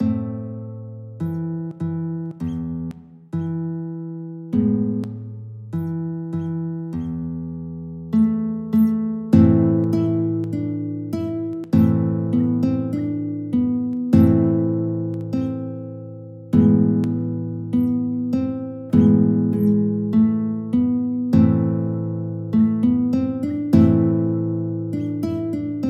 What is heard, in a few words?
guitar